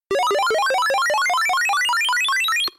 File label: level up